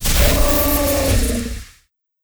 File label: boss main; monster; Bizen; steamer crazy